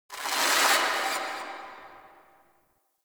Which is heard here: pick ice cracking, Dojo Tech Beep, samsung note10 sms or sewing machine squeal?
Dojo Tech Beep